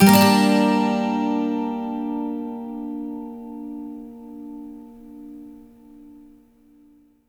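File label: 12 string minor, Acoustic Guitar Loops; USB Soundscan vol.04; AKAI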